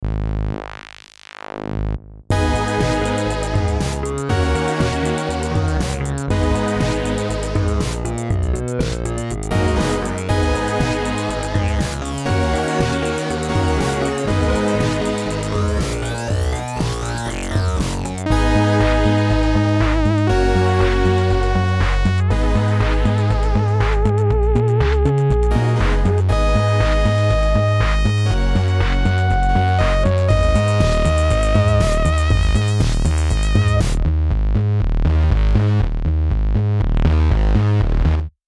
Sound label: Candy Electro, Electroc Funk presets; Big Tick; Rhino Electro